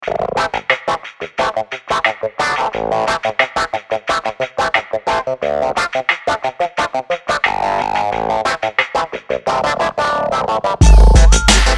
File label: distorted clav, vintage keyboards; logic pro